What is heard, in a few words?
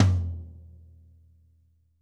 tom, drum; 90s sample CD